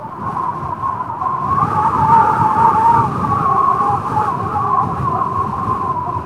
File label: Wind Whistling Loop; weather fx; Silent Hill Downpour